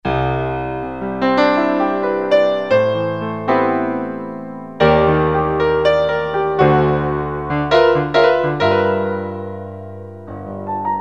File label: Piano